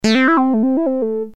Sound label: legato